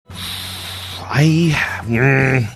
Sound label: inhaling saying i growling